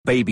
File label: baby